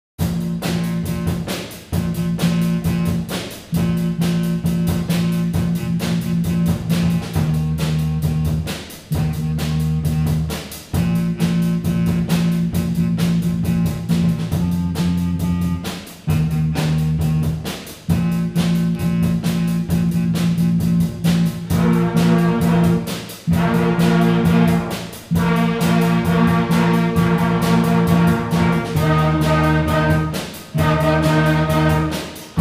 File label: Big Beat Boogie